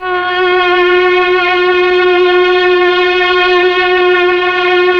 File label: STR, Melo. Strings, Slow Strings